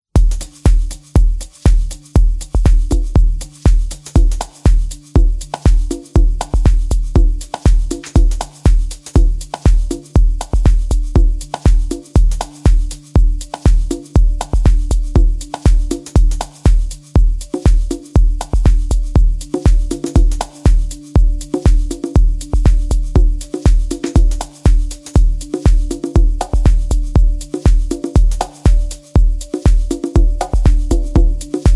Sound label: Greek House